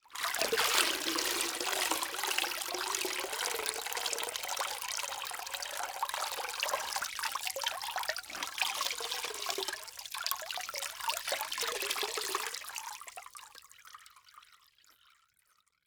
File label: Liquid Water Water Dripping